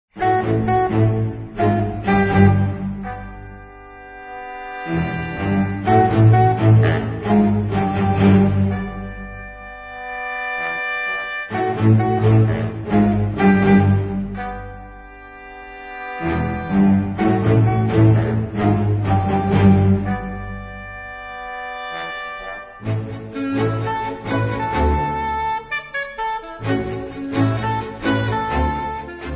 suspense

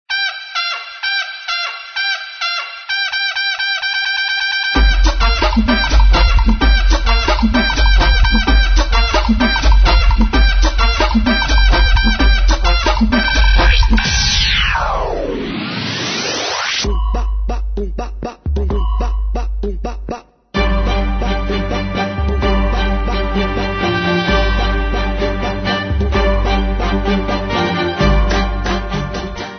funk russo

